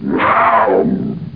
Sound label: cat die; roc